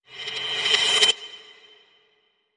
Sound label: Sting Reversed Chime